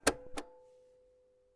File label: Portable Old Cassette Player, Light clunk of eject button; sound ex machina